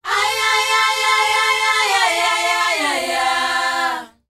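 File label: "AYEYAYA", World Voices South African; ILIO; Vocal Planet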